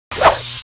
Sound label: ATTACK